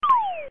lose; monstersunion

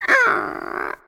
move rejected; guodongrenwu

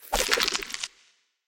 shuffle

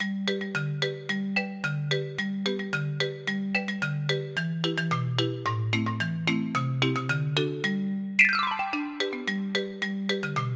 main music; game; soccermove

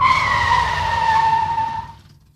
skid

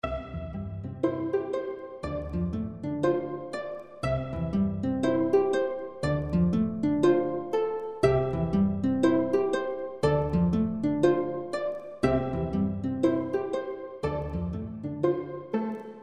soundtrack

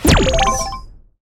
medal

